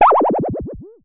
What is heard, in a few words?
Synare Filter; Star Instruments Synare 3